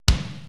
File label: 80S DRUM SET